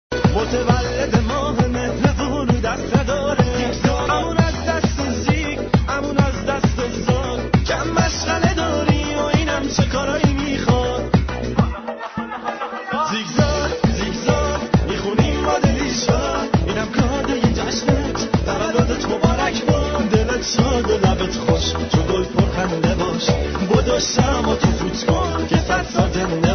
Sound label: Birthday music